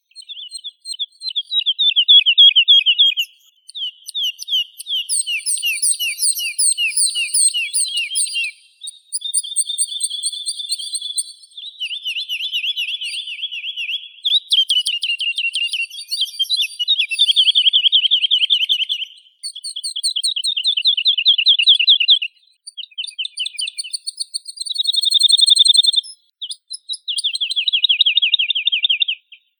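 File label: woodlark, Lullula arborea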